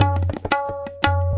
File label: 173 fancy fingers tabla break, DRUM LOOPS and BREAKS